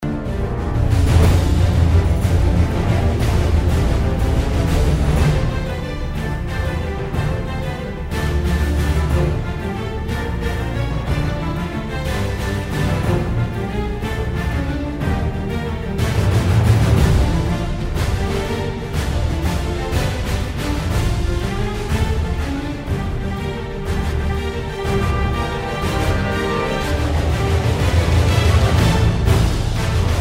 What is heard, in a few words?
synth